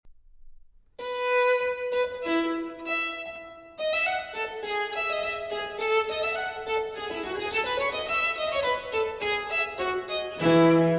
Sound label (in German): Solo 1, F-Dur für Violine & B. c.; Klaus Hofmann